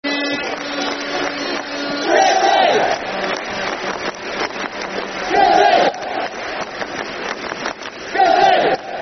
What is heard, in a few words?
(Clap) Football Chant (Soccer Song); City (Clap); Coventry City Ccfc